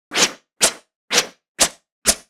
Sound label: Fast Action Swish; Hits Whoosh; Mechanical Wave; GDC Game Audio Bundle